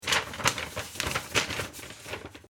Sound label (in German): office papier